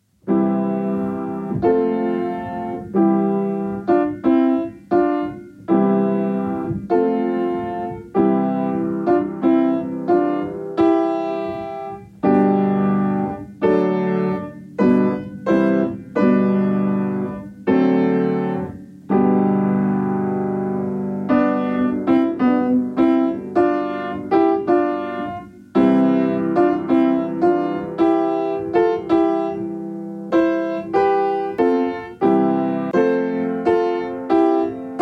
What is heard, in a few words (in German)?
Klavier; Oh du Froehliche